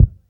old school original hip hop kick drum sound F sharp key